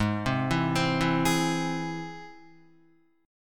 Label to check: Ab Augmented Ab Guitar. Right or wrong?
Right